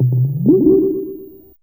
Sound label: Sproing, Fairlight CMI Effects; Effects3